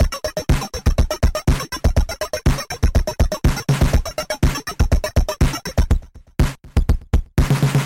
SWINGING PERC

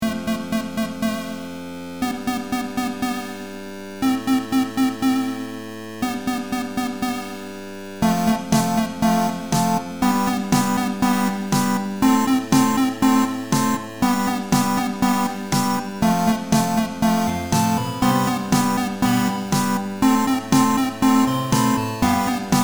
electro mines, chiptune; 8 chiptune tracks; chipville

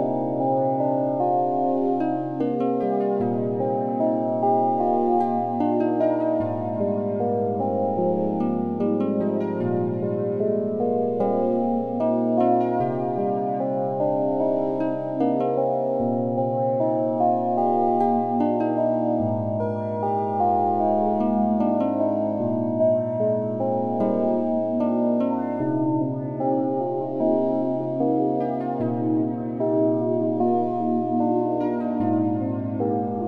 space arp faster

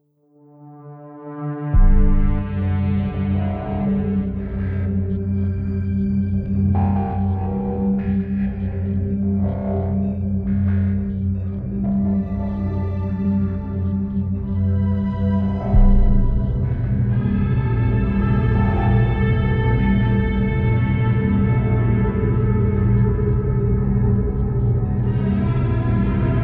ambient main

